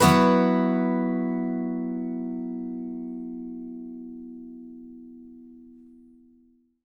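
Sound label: acoustic guitar B sus up, Whole Lotta Country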